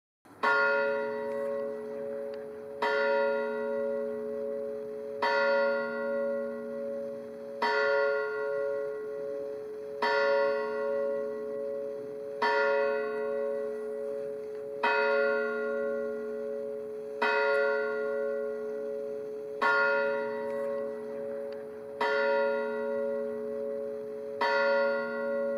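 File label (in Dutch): klokken oud-beijerland, het geluid van de kerkklok; Dorpskerken in de Hoeksche Waard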